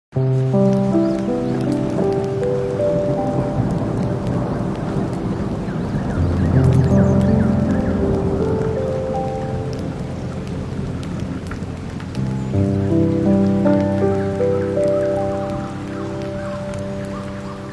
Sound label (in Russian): Zvuki Dlia Sna, Shum Dozhdia, Zvuki Prirody, Shum Moria, Zvuk Vody - Zvuk Vetra. Shum Mori; zvuk kostra; zvuki lesa; shum morja